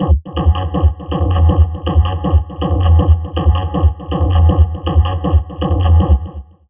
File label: Drum Loops Crazy Processed